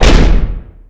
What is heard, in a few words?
Slam Open Door; Welcome to the Game II